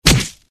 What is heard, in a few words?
crash kick; disneyxd; stuntastic